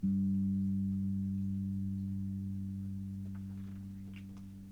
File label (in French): Basse sol